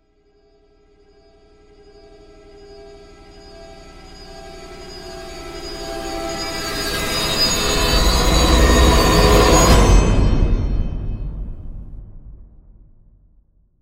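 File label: Like a 100-metre guillotine falling; Падение; Звук саспенса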